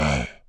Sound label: sick